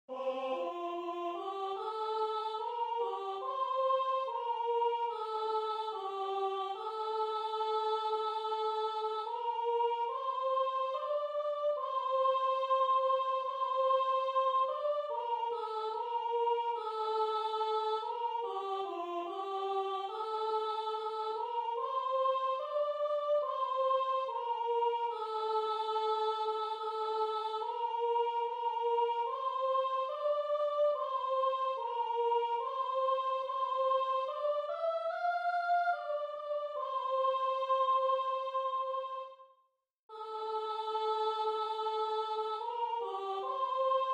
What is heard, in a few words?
soprano